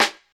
trebly steel snare drum sample a sharp key, steel snare drum tuned to the A# note